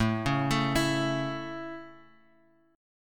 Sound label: A Major, Guitar, Open F; arpeggiated